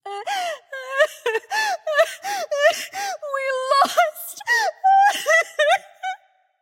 boohoo light; smite; voicepack; hel

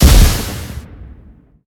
phoenix fire hit; smite; npc

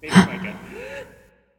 breath; Smite; athena

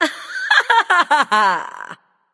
laugh; smite; skadi

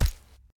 footstep; smite; ganesha

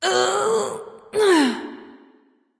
grunt lift; smite; nemesis

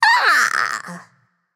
grunt attack; smite; babayaga

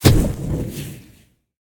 hit fire; smite; god merlin

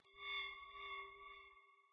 angelic victory; Smite; Thanatos

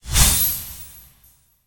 scepter magic; smite; hera

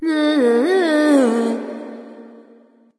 tongue light; smite; voicepack; hel

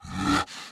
zombie run; smite; npc; roman archer

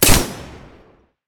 commando basic heli; smite; zhongkui

